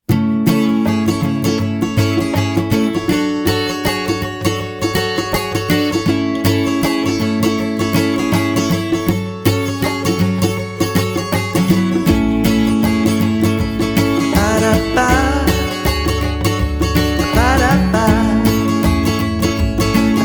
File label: Fun Acoustic Vox